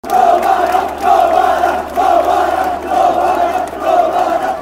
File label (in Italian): Novara, cori curva nord